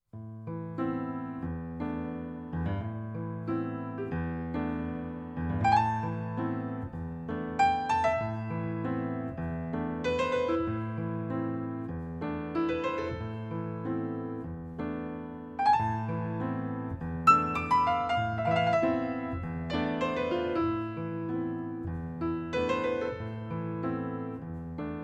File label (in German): Psalm a-moll Dur